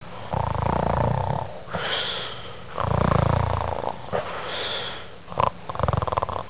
zzzz

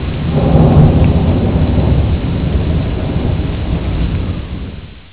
storm, Another thunderstorm, but shorter.

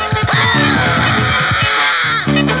SCREAM

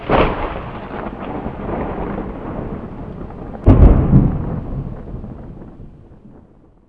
thunder, texas; tropicana

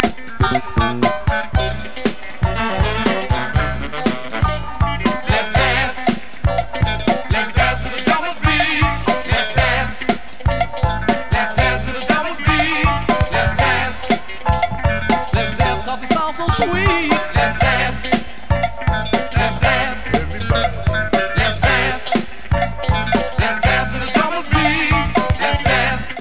drummer

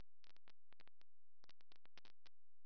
breach; startrek

